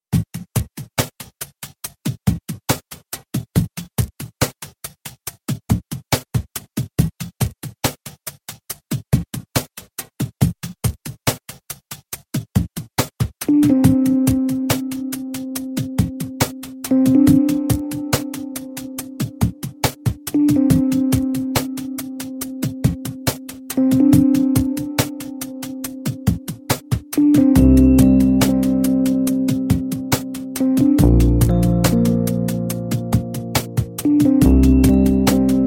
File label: Rainy Chill